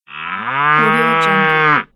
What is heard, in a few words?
cow mooing